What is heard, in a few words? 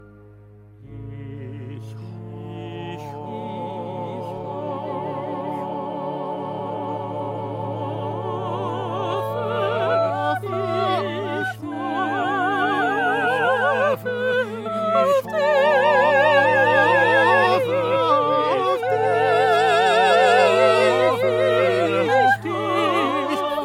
Tutti tenors; mesures 163 à 175; Requiem